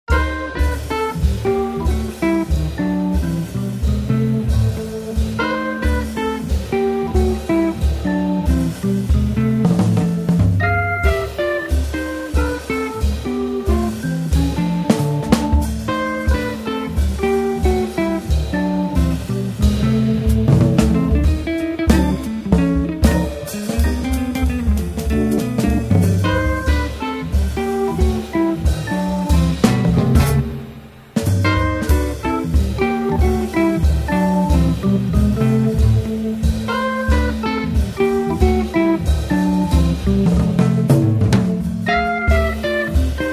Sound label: Organ Trio, Blues